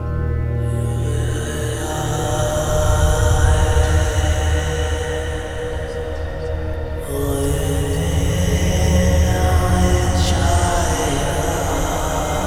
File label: Synvoxperc Scape; Sonic Foundry (Sony Creative Software); Ma Ja Le; Soundworlds